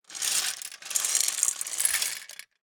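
Gumball machine, metal, glass, candy, flip, jostle, clatter, 3 moves, hyper; Eiravaein Works; Vaeyan IV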